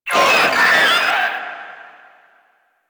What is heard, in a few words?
Infested Scream; Entrati Labs; 1999 Outro Cinematic